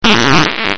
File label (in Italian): scorreggina